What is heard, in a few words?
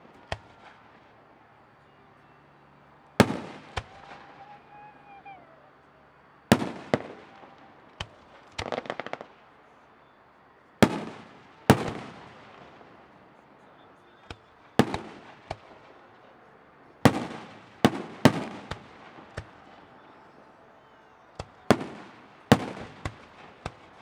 fireworks; Ambisonics